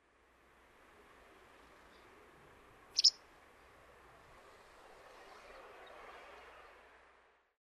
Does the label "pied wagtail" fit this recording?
Yes